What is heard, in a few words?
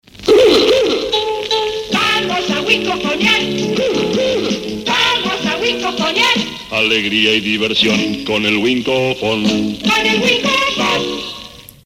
jingle